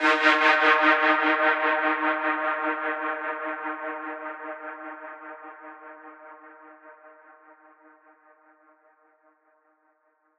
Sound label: synth note long release D#; Virtual Riot; Synth Notes & Chords